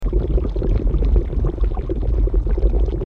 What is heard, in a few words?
cauldron